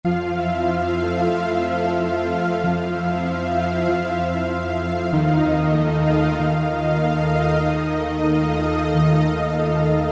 Octave Pad, Alesis Z5; Vintage Synthesizers And Classic Beatboxes

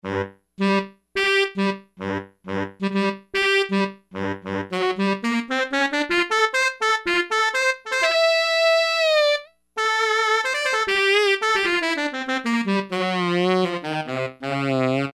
Stereo Sax, E-mu Proteus-1; Presets

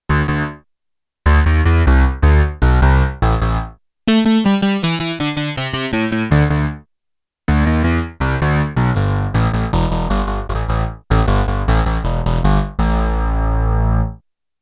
Korg DW-8000 DIGITAL SYNTH BASS, Factory Patches

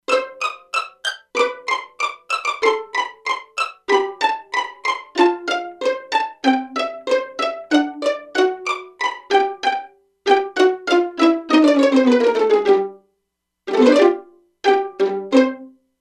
Pizz Violins, E-mu Proteus-2; Factory Presets

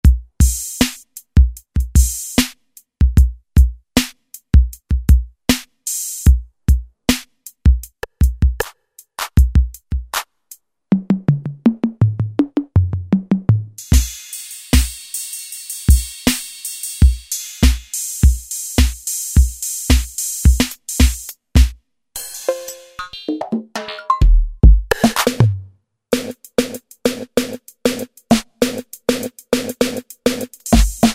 TR-808 SET; Roland SR-JV80-11 Techno Collection

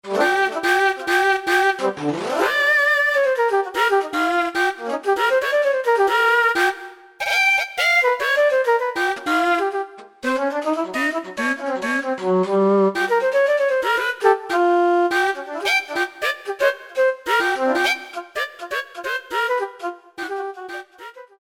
Jazzy Flute, Roland SR-JV80-01 Pop

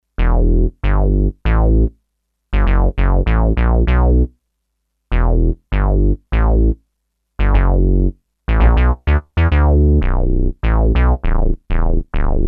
Res bass, Sequential Circuits Six-Trak; Factory Programs

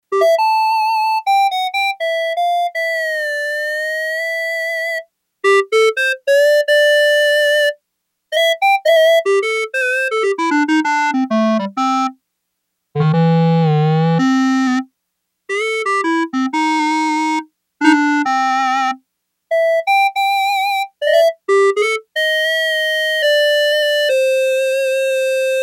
Clarinet, Yamaha TX802; Factory Patches; Voices

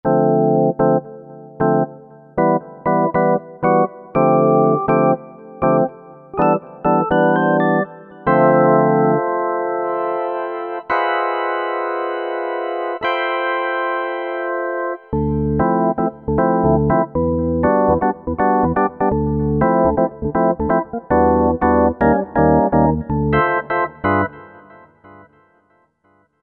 HarmoOrg Mod; Roland SRX-05 Supreme Dance

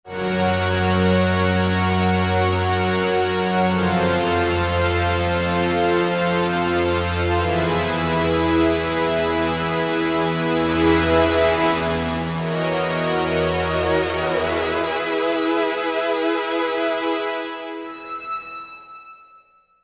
JP-8000 Pad; Roland SRX-05 Supreme Dance

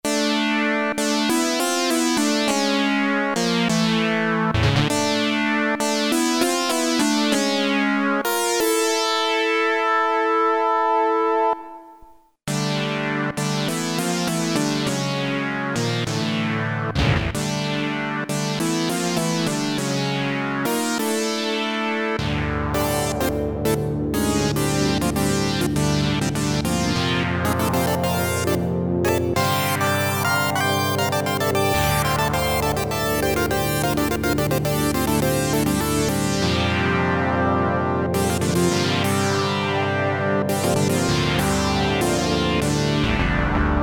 DOWN FOURTH, Roland Jupiter-8; Factory patches

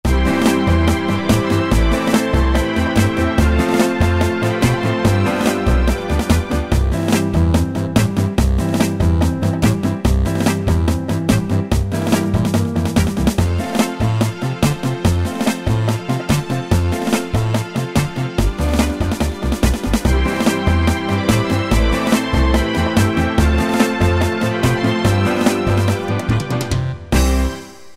Oldies; Preset Styles

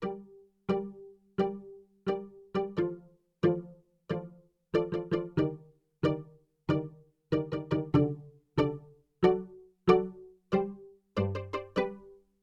Pizz Chorus; Yamaha TX16W

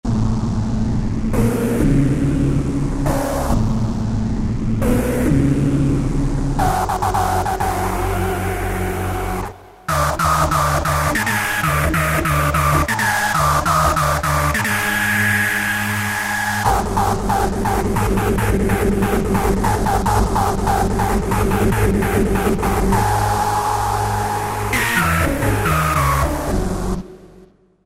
Dynamic Rave, Korg TR-Rack

